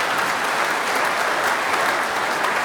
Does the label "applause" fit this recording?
Yes